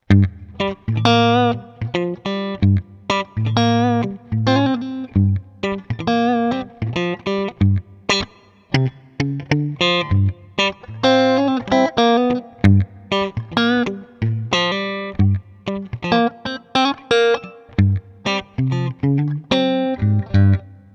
strat funk

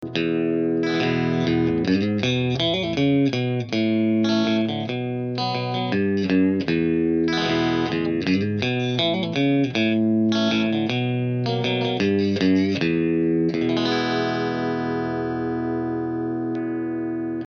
Middle Bridge Clean, pickups

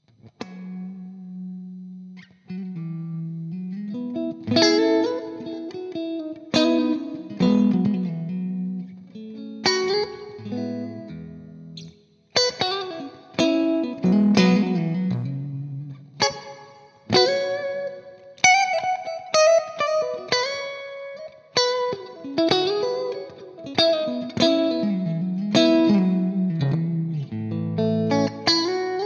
S D Clean, pickups

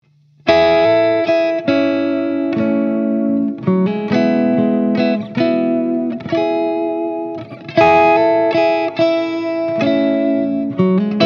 Neck, pickups

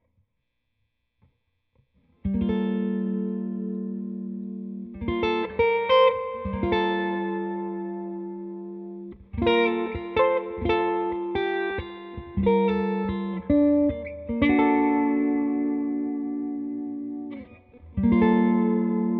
firebird, pickups

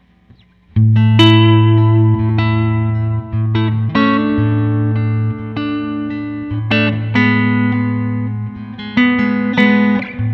strat clean

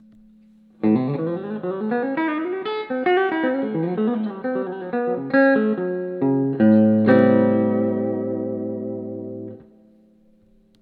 fat man tele bridge

